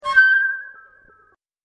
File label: space flute